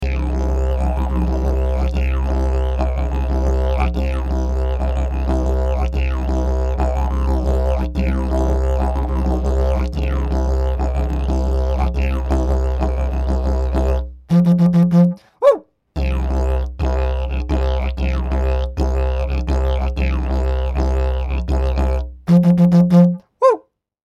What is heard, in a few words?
didjbone fling c